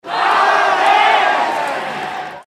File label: Cheer Crowd Baseball Japan Chant; Crowd cheer at baseball game in Japan; Japanese crowd chant; Baseball Sound Effects